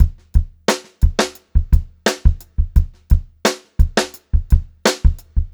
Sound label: Funk-Groove Drumloops, 87 STRVAR; USB Soundscan vol.38; AKAI